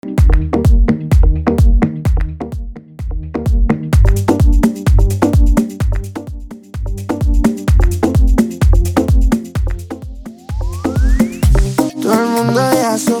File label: afro 128bpm